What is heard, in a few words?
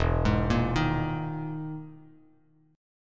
E Major 7th Flat 5th down Bass Standard; EM7b5 arpeggiated; JGuitar